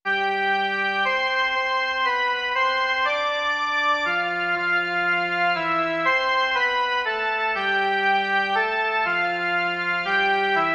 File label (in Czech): jen melodie; Bůh, jenž láska